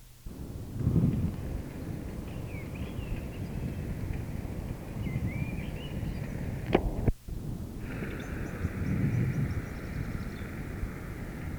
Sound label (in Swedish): koltrast